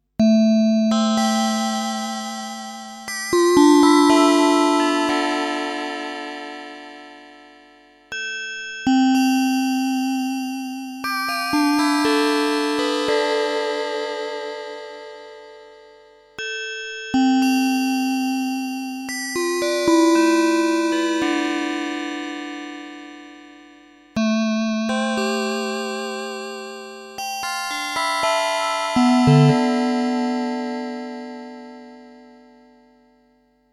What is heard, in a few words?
ASR 10 Transwaves random